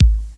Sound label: mid range steel kick drum sound g sharp key